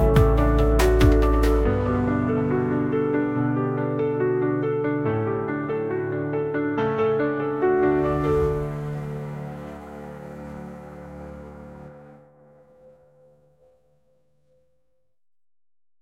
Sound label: Logo Piano